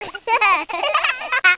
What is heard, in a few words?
giggle; Children giggling.